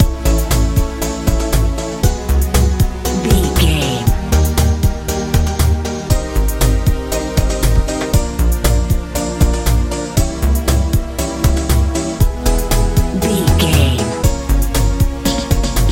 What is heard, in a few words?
techno house